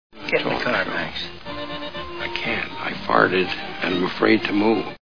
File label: farted